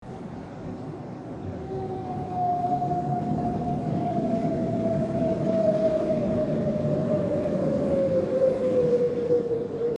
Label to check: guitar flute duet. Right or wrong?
Wrong